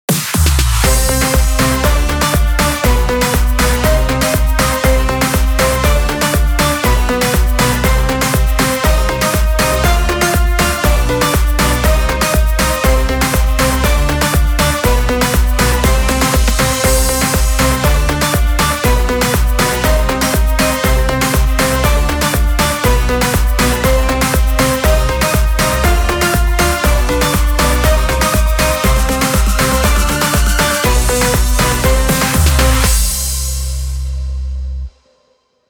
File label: background music for short video vlog latin dance house funny sound